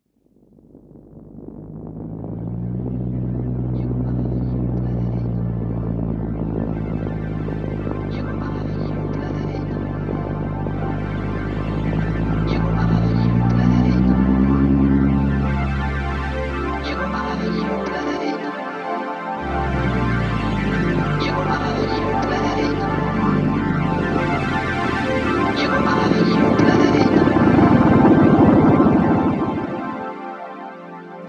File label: Electronica